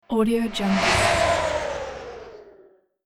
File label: diabolic magic transition; sonic-boom